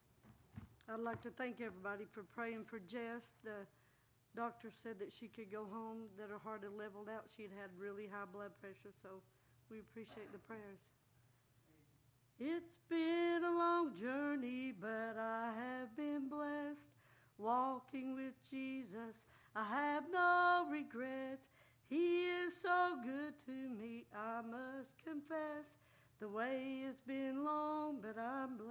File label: singing